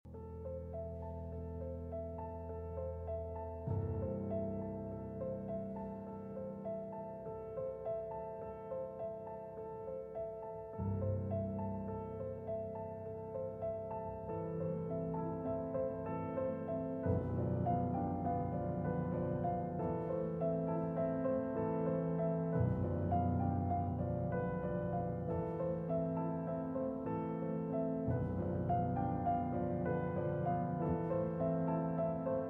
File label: Piano Duet; II. Paul Is Dying